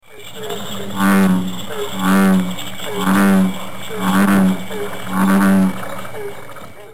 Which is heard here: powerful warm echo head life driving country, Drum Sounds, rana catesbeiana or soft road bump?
rana catesbeiana